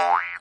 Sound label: jumping